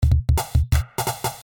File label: Drums; D n B